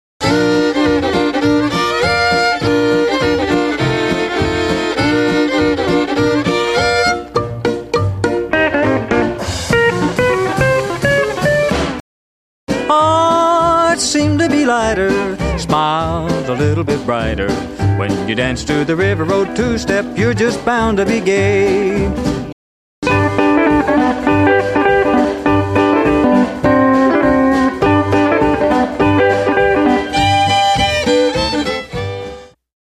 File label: Two Step